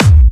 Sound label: Clubby Kicks, Bassdrums